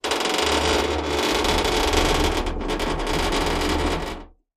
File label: door creak med, squeaks